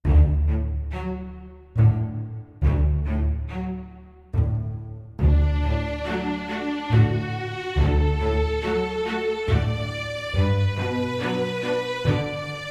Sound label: Winter Waltz Instrumental